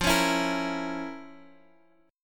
F Major 7th Suspended 4th Sharp 5th, Guitar, Open D; down; chord shape